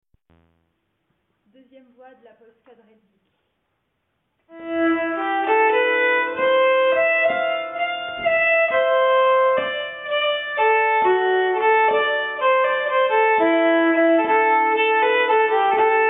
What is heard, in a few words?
Polska, nyckelharpa; Rattwik Polska